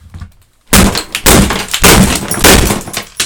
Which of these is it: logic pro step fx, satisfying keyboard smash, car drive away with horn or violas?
satisfying keyboard smash